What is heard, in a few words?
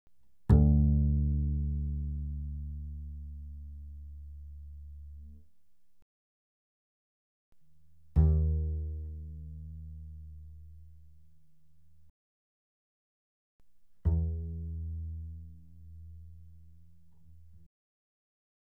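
Bass pizzicato fortissimo sul D D2 B2, doublebass; Strings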